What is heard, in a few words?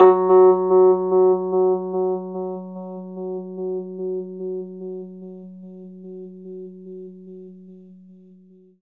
RHODES OCTAVE F#; 3D Sound; 3D Keys VOL-1